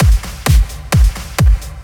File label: drums